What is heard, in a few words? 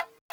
tik